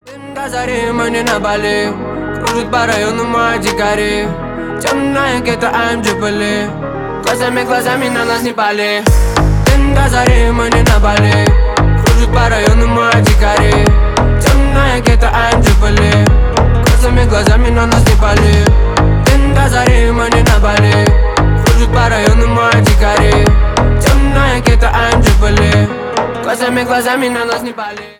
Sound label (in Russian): рингтон